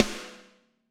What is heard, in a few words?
Snare Crack